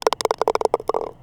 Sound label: ball in cup; GTLive